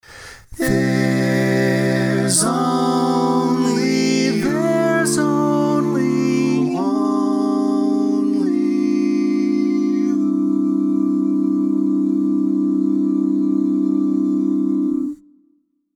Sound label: All Parts, barbershop